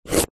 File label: carrot slice knife; zapsplat